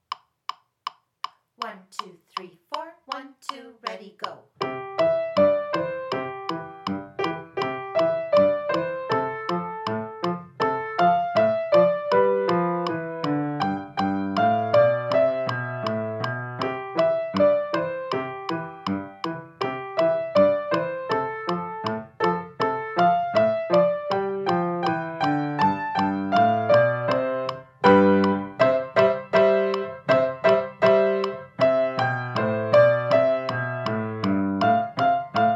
Jingle Bells

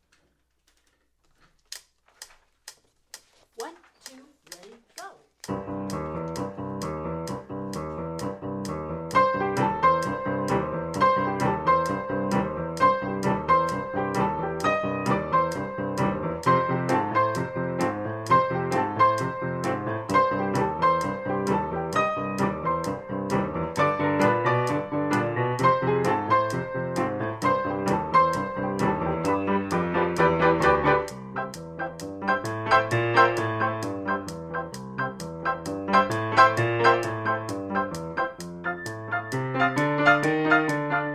Good Old Fashioned Rock and Roll

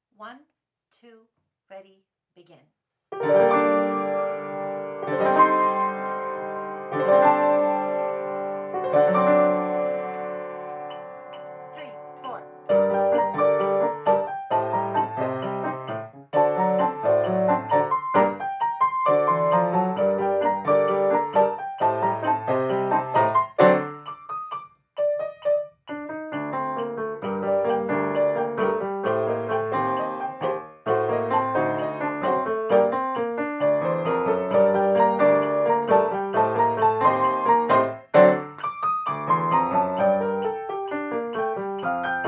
Rumba de la Noche Duet

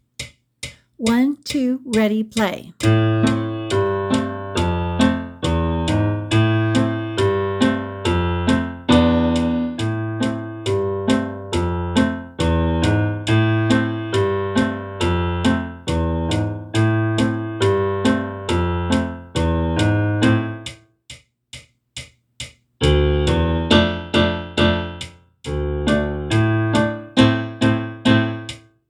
Knock Knock Knock! SECONDO

